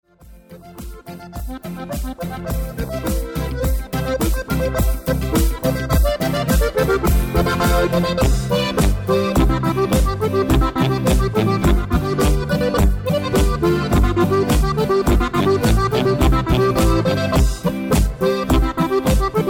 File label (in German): Boarischer